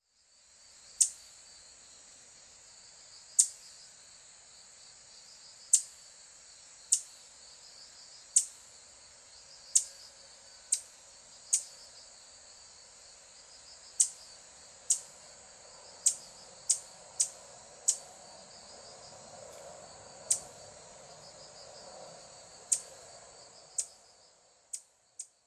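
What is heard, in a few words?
Asian stubtail, hyogo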